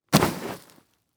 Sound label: Impact Body Fall Scrape In Leaf Debris; Impacts